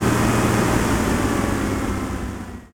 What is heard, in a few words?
engines; World of Tanks